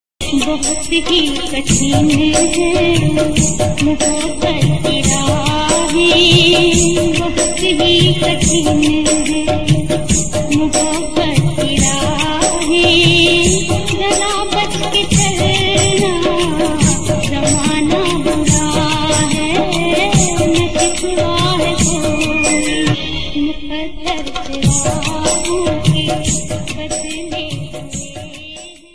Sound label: hindi ringtone